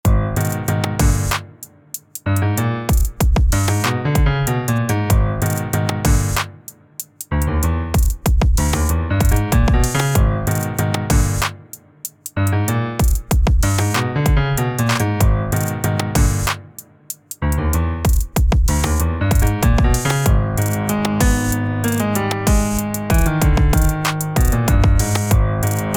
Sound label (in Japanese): Trap系のドラムとピアノリフの組み合わせ; Beat EDM Trap ピアノ 不気味/奇妙 怪しい 暗い; 悪い企みをイメージした楽曲。; 二種類の展開を交互に繰り返すシンプルな楽曲になっている。; 悪事や諍いごとなどのシチュエーションにマッチするかも？